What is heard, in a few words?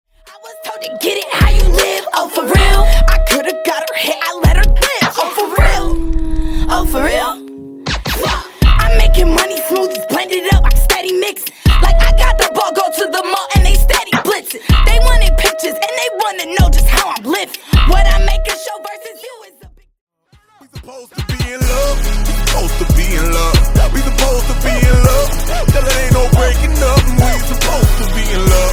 HIP-HOP